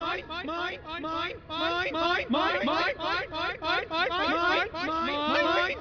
boombox; cstrike; jailbreak

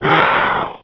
zombie death; npc zombie; cstrike; jailbreak

